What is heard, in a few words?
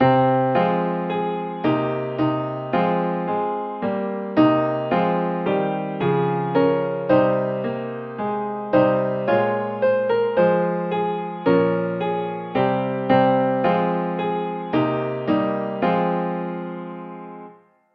accomp; hymnary